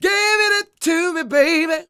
SING, Vocal Planet Blues Soul BLUESY

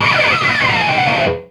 SLIDE, HEAVY METAL; Greytsounds

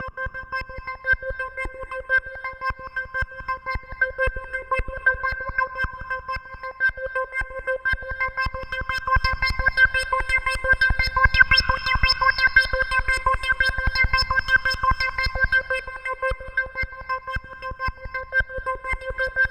C4, CLUB ORGAN; Roland JV 2080

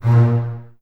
Basses A#2, String Ensembles; Miroslav Vitous; LINKS ORCH

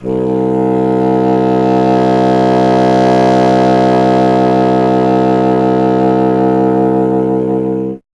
C. Bassoon, solo instruments

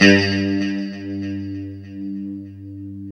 LO E G 2, Guitars; Spectrasonics; Hans Zimmer Guitars VOL-1; JC-SHEEN

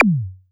Elektron Monomachine, Drum Machines

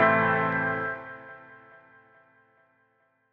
Piano A; Zero-G Sound Sense Grime Scene; GS Construction Kits; Just Flex

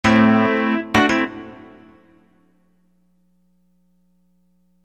Phunk Phrase; Sonic Foundry (Sony Creative Software); Hip-Hop Street Beats Construction Kit

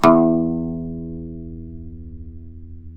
OUD E1 R, Turkish Oud

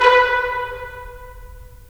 BRS, Tps Stacc; Roland - LA Composer vol. 4

